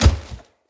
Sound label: Heavy Grunt Boot Walk Veg, Footsteps; Enemy; Sinecure